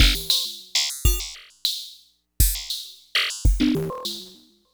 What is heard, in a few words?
Ratchet Beat